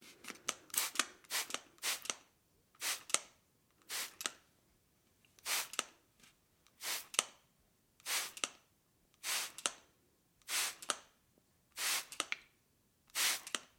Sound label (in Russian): pulverizator raspilitel sprej